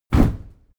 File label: cardboardbox soft